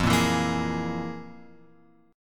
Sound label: F Minor Major 7th Double Flat 5th; FmM7bb5 Chord {1 1 2 3 X 4} strummed